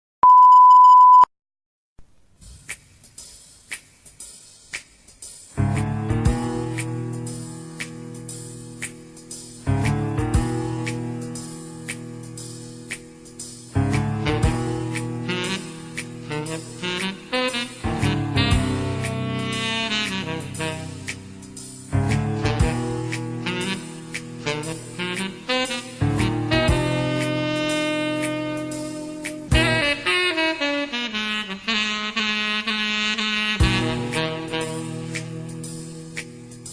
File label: Gymnastics Floor Music; club championships